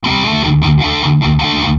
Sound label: chorus